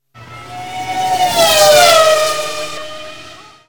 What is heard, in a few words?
Car Passby Pitch Up